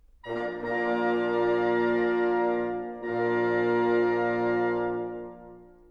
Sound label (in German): Akkord